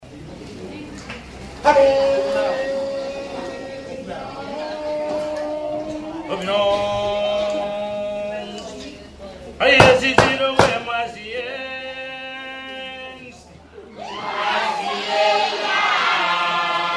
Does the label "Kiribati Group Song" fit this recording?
Yes